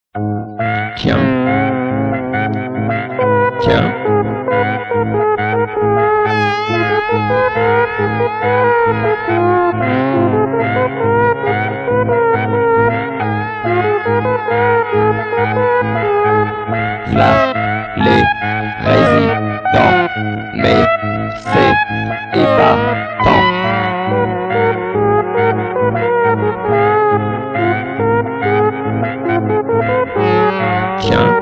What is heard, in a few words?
Jingle